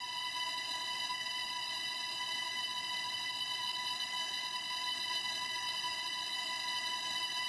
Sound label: STRING; M8